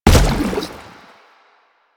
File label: Step Default, Footsteps